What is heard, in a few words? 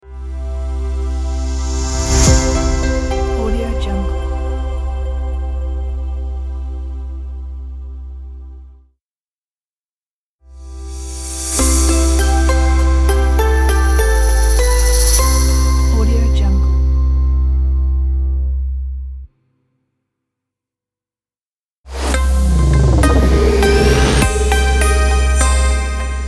logo reveal pack